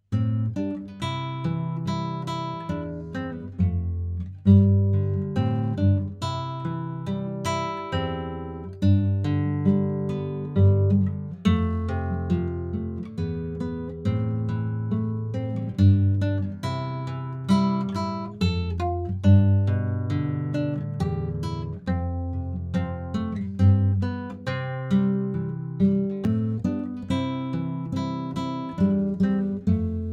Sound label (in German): El senyor Gitarrenarrangement